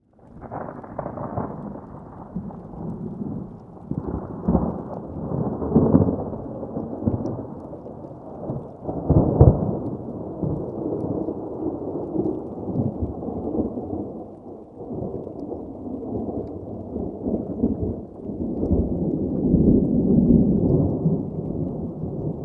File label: nature thunderstorm top chacaltaya mountain 5300m bolivia; felix blume